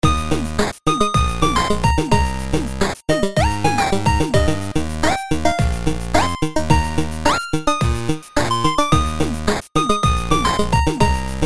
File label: Complex 8bit; Kawai